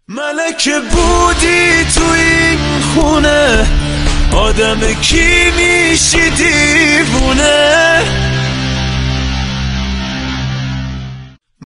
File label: Band